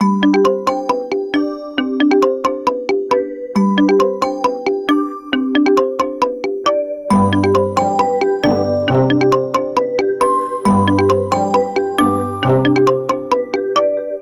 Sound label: Vigor